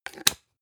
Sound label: spice jar big scratch close hard; industrie wirtschaft; gastronomie